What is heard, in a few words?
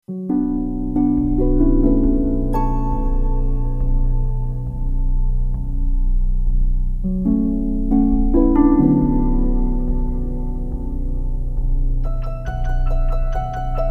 WARM, ambientscapes